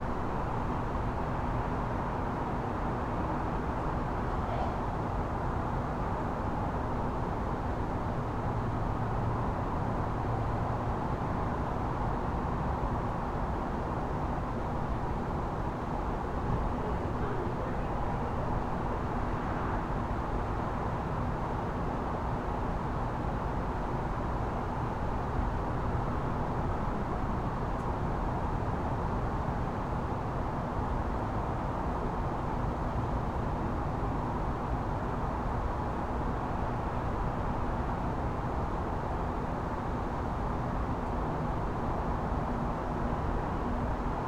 AMBIENCE ALLEY Downtown HVAC Distant traffic; Diffuse City; Collected Transients

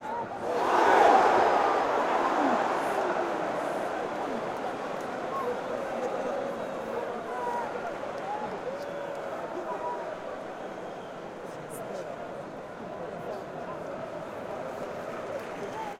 Goal Miss, Soccer Stadium Ambience; Sonic Bat

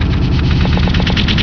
wall bit, ambient; hl2; kraken

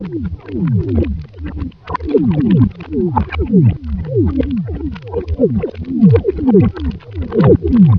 voice, ichthyosaur; hl2

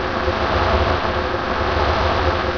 air duct; hl2

